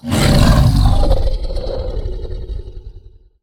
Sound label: Roar